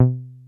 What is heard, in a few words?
303 C3, TB 303, Synthesizer; Hollywood Edge; Giorgio Moroder Rare Synthesizer Collection